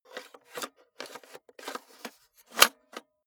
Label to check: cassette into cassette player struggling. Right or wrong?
Right